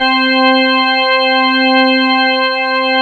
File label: DW8 ORG C4, Korg; Back In Time Records; Korg Universe VOL-1; DW8000